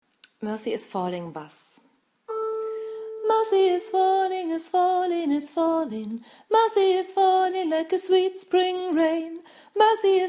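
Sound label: Bass, gospel choir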